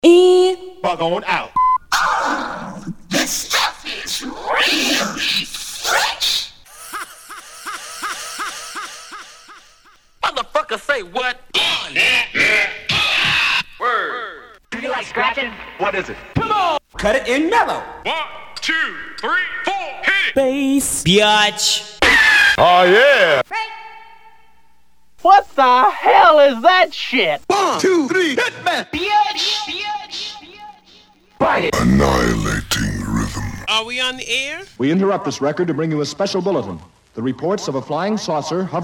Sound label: Scratches